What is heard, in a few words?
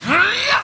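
voice charge up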